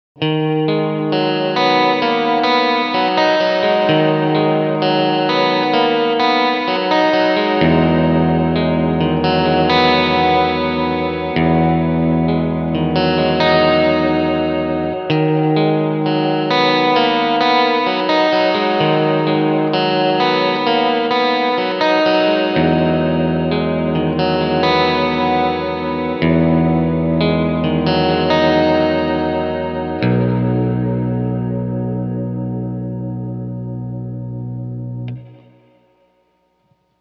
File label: magneto; BigSky